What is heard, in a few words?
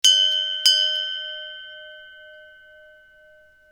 nautical bell